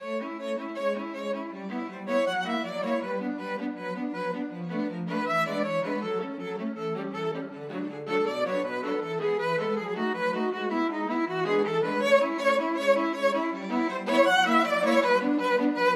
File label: carnival finale, string quartet